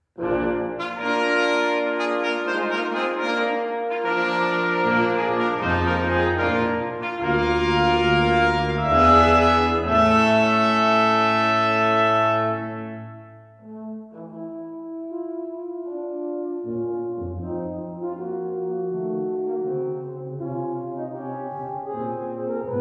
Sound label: Brass fanfares